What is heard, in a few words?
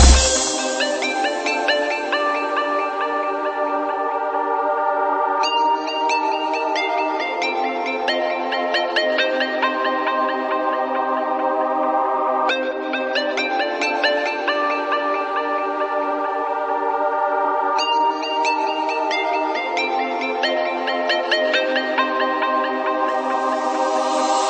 wonderful music